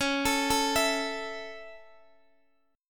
C sharp Minor Sharp 5th down Guitar DADGAD; C#m#5; arpeggiated

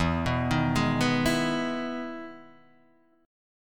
E Major 7th E down; EM7 arpeggiated; JGuitar

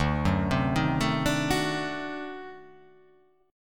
D 7th Flat 9th D down, chord

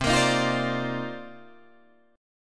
C Minor 11th C Guitar Drop D

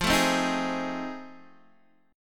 F 7th Sharp 9th, Guitar, DADGAD; F7#9 strummed; x,8,7,5,6,6

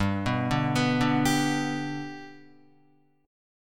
G Major G down Guitar 4F 4A 5C 5G 5C 6E; G arpeggiated; JGuitar

